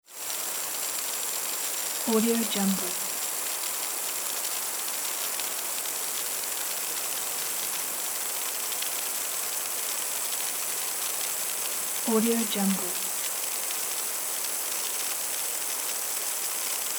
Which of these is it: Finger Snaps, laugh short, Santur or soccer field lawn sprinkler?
soccer field lawn sprinkler